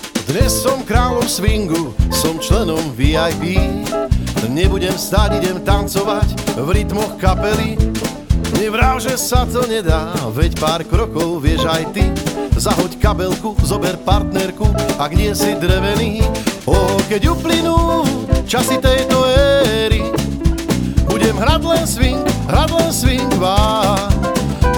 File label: swing